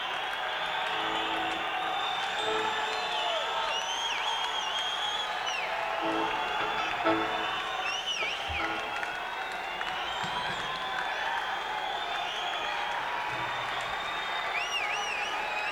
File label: Tuning